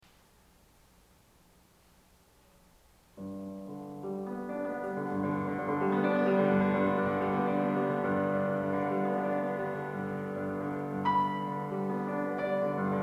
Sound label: Andante Spianato et Grande Polonaise Brillante LIVE, Piano; Chopin; Op22